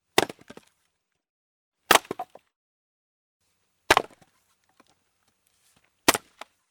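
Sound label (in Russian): дрова колют рубят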